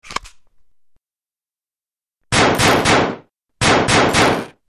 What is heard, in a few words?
AR-15 Gunfire; Mike Koenig